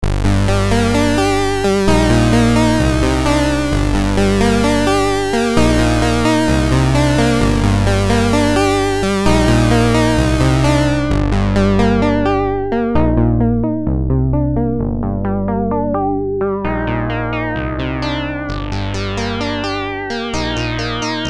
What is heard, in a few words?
SH01a Mono Mode Chiptune Lead Filtered